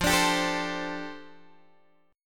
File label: F 6th Add 9th F Guitar Open G